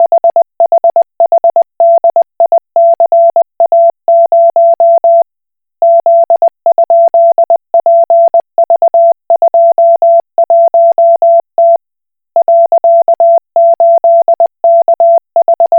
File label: Koch Morse